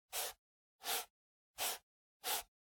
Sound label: PC Mouse Move Soft Pad Short